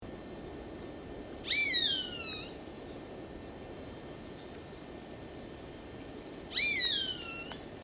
buse cendree

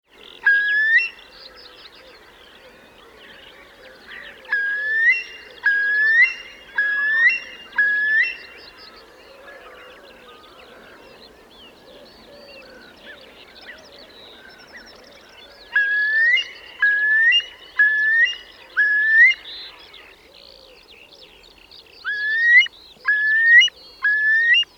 courlis cendre

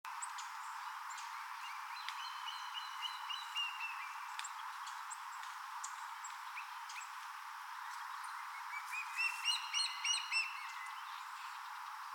buse noire, chant

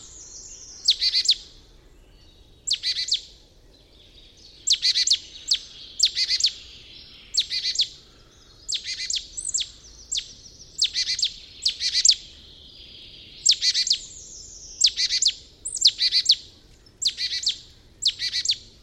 mesange charbonniere; chant

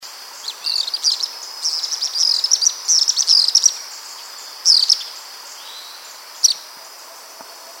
martinet a croupion gris

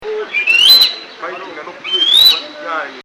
gymnogene d afrique

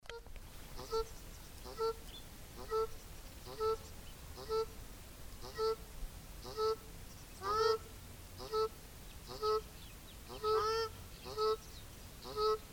tadorne a tete grise, chant

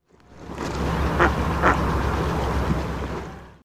grand cormoran

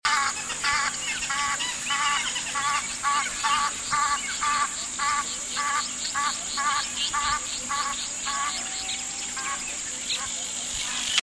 ouette d egypte

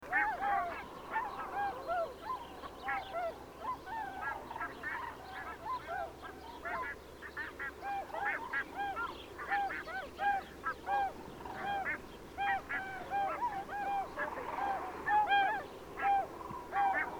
cygne de bewick, chant

